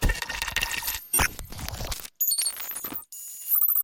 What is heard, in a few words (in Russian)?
barabannij zvuk; iz gotovyashegosya k vipusku nabora botesvoid drumkit